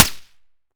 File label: fire sup; weapons; m16; arccw ud